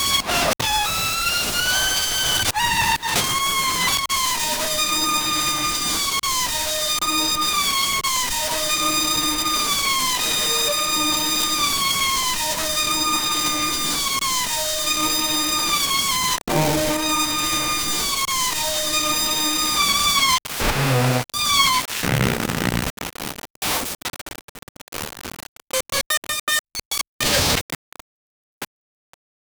dystopian hip hop skit

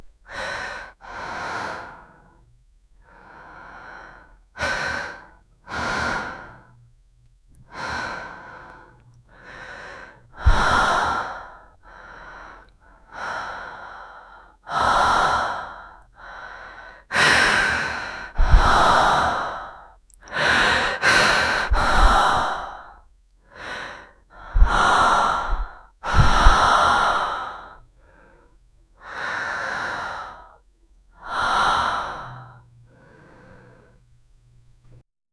female breathing slowly

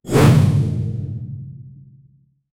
heavy axe throw medium wo

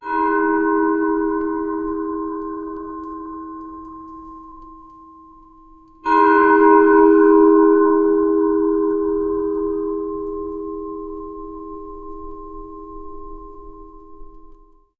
church bells for a horror

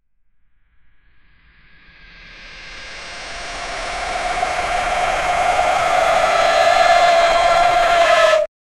zoom in fast rising pitch; whoosh

